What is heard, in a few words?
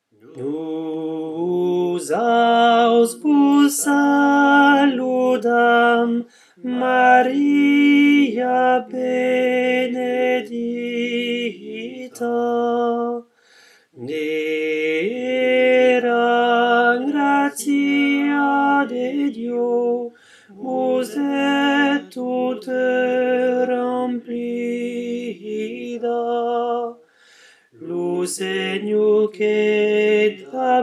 tenor; ave maria de bareges